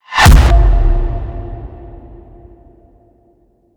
impact signal; Virtual Riot

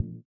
VIBRA; Spectrasonics; Metamorphosis

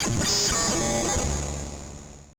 AMBI FX

